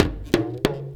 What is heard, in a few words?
BIG TAMA HIT; Best Service; World Colours